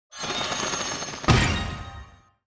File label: attack; hero